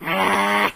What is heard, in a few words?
Hmm, voices, Pirate; hedgewars